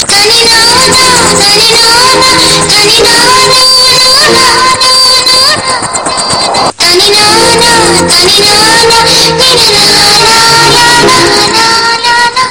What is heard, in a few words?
horror humm ringtone